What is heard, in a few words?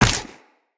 Run Ice, Footsteps; Tenno